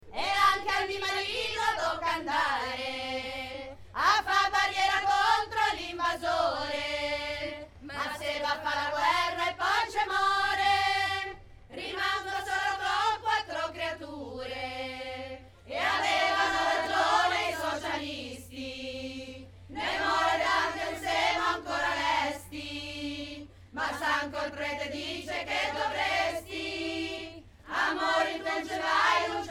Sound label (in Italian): coro